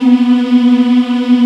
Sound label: African Mm f; Roland LCDP11 Africa VOL-1